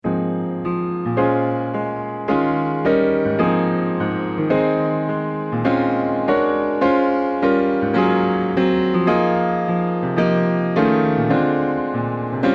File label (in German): klavier modern jazz klang witzig musik spass; berlin schule